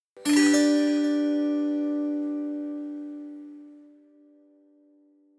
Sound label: Happy Harp Sound